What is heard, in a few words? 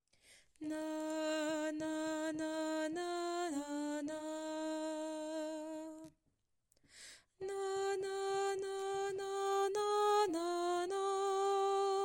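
Soprano; soprane; Mesures 23 à 43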